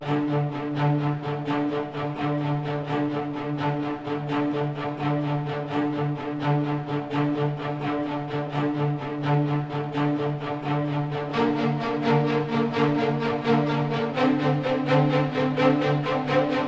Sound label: Loop Nofade Nobase Acento; King Lucas